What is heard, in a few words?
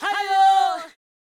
Fem Vocal 128bpm G FX, African Voices